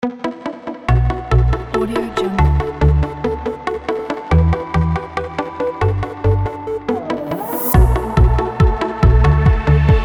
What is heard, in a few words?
tech advertising upbeat electro pop